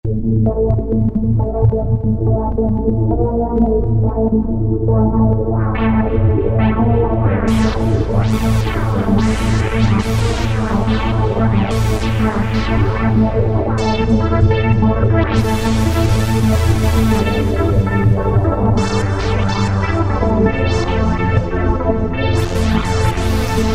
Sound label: Trancey, Roland MKS-50